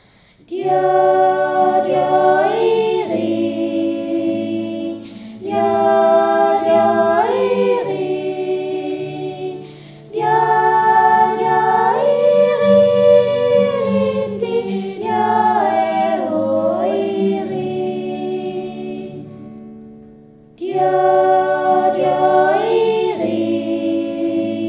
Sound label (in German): Andachtsjodler, Klasse 2D